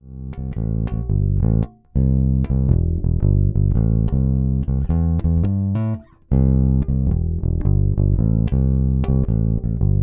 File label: Fodera NYC Empire Jazzbass Neck Pickup